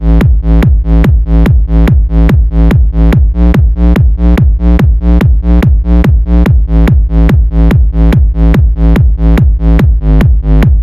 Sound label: Deep Bass Sample